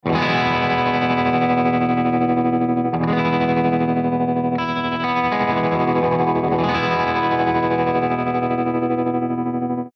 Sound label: TREMOLO P90; BUCKINGHAM TREMOLO3